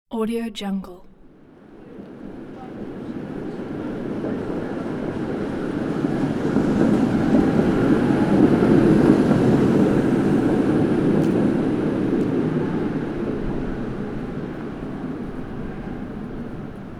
tram passing through city street

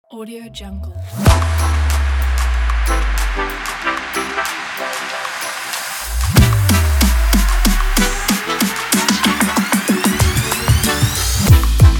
future bass

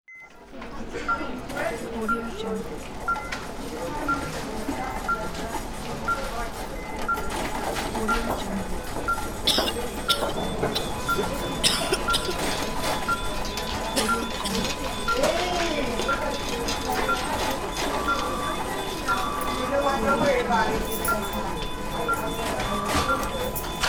hospital ambience

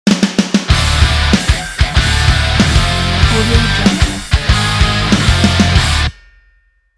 rock metal guitar ident

